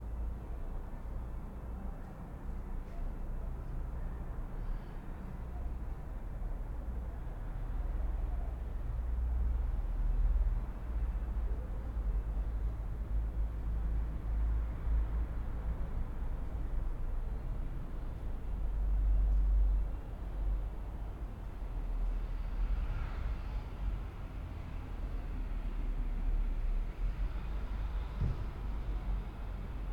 Nighttime-Suburban Residential Area Main Street Lively; Systematic Sound; General Ambience Series; Nightscapes 01